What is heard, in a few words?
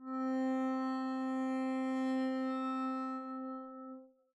arco c4 pp up, double bass; dsmolken